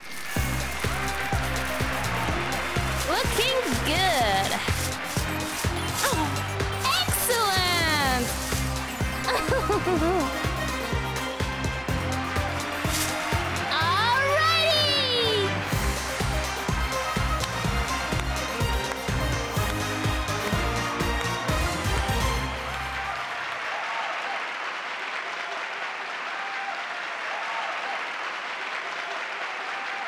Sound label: End Cheery; BTV